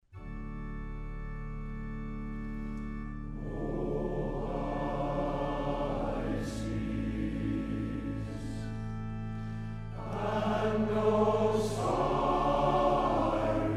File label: Priests Chorus, male choir